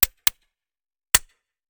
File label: Musket Foley; Sound Ideas; Guns